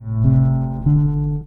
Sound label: Bass loop